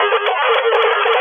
prattle phone; OSWorkshop

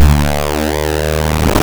farty bass; OSWorkshop

